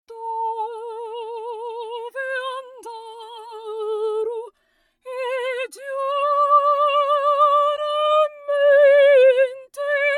Opera Phaser Flanger

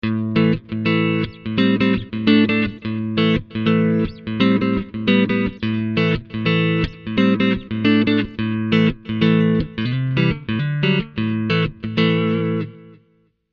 Clean Short Delays

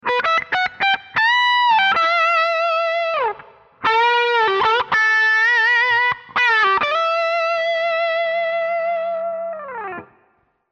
Latin Solo

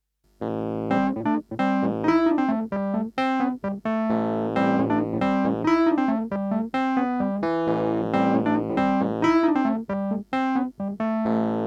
Funk Keys Dry

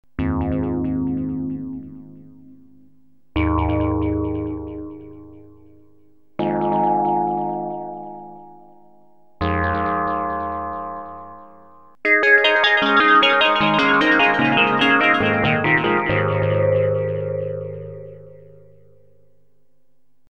cluster bombs

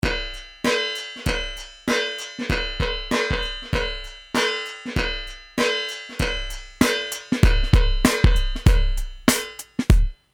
Drums Metal Scrape

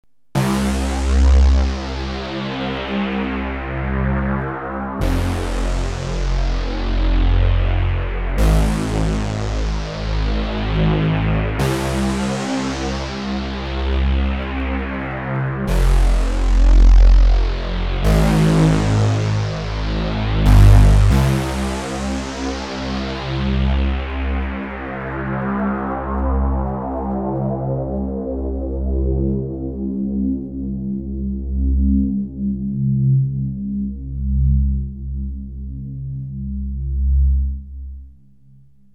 unison chorus

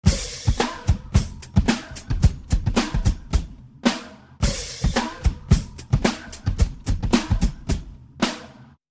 drum loop Detuned Chorus

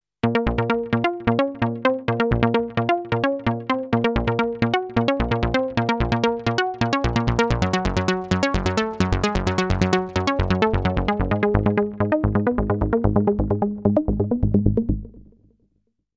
floating mutes

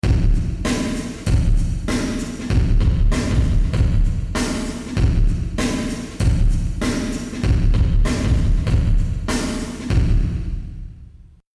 Drums Empty Venue

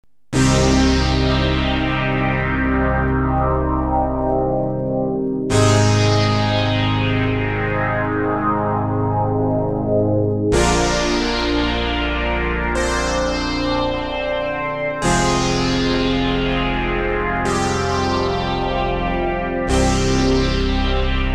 reso chorus

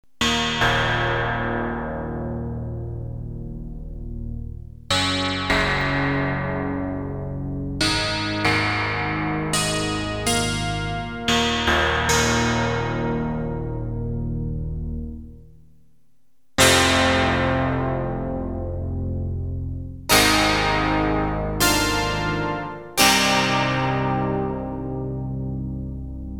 PPG Keys

